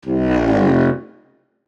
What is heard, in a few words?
Cartoon disappointment failure